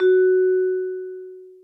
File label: VIBE F#4, VIBRAPHONE; Greytsounds VOL-1